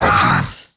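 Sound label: Totem Damage; Seer; GamigoNA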